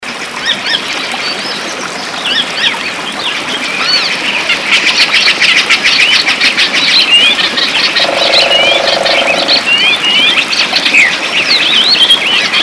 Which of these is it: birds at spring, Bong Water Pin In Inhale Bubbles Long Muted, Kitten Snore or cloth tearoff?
birds at spring